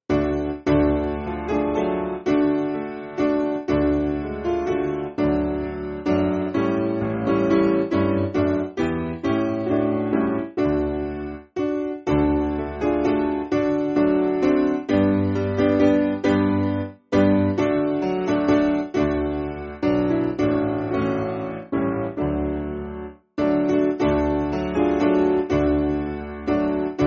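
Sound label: Piano, Music Backing Files for Churches; My Father Is Rich; Binghampton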